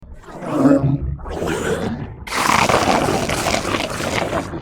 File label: monster alien grunt hiss